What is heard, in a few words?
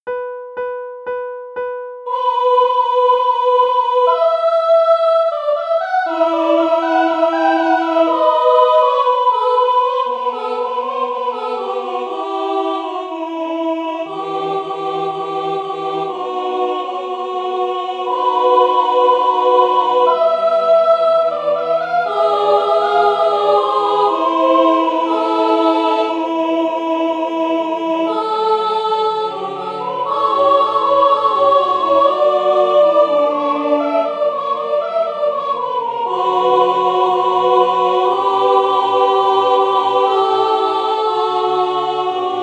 Propter magnam SA; Vivaldi Gloria